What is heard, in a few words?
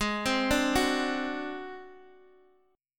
G sharp 7th Flat 5th G sharp down Guitar Open D, chord